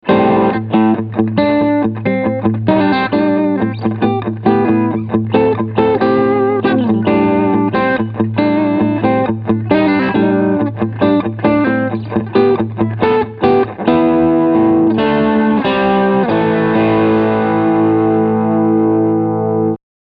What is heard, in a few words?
1962 Overdrive